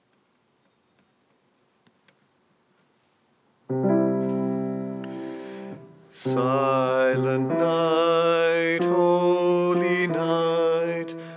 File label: Bass